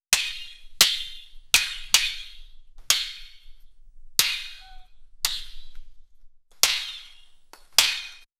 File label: medieval soldiers running